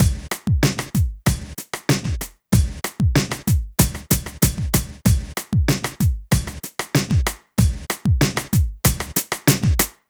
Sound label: Club Tip, R&B Drums